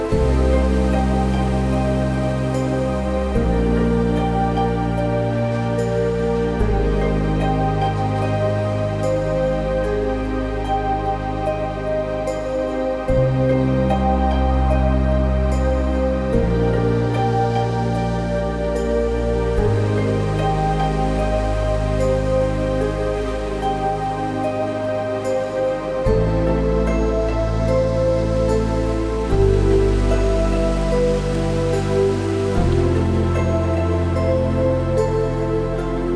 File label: Floting Waves, The Deep Sea; звуки природи